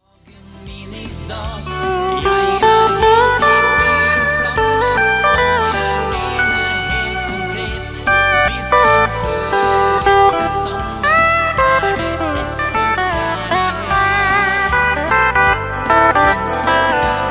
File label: steel